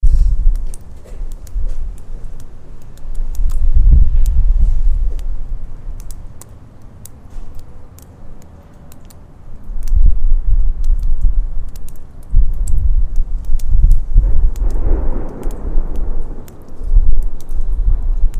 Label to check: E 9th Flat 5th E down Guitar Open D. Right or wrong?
Wrong